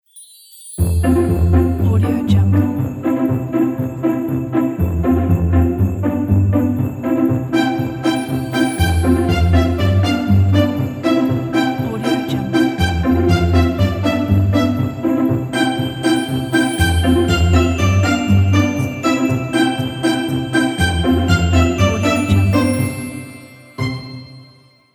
jolly santa claus; kolokol

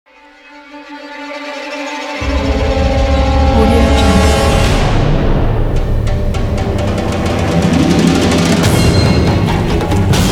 classic chaos orchestral action

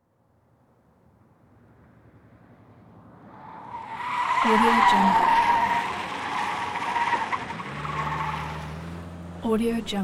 automobile chase skid and squeal accent

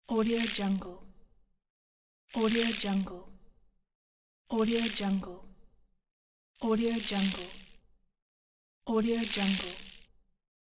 mosquito flyby

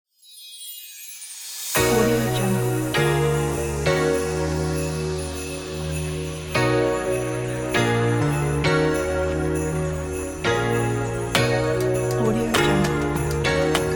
happy xmas days; abstractelement

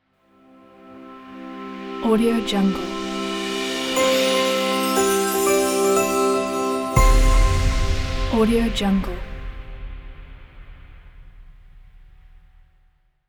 dream piano logo

